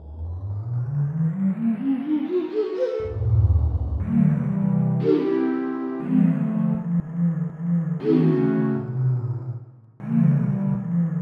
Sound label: Shakuhachi; EverQuest